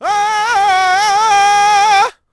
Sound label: sing; Splashdown; XBOX; Amman